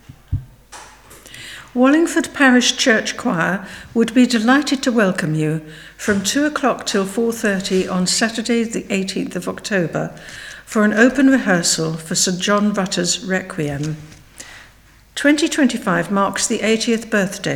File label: Requiem choir rehearsal